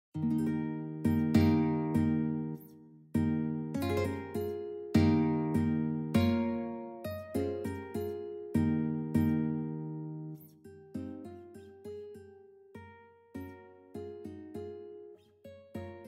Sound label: guitar excerpt; Aleluya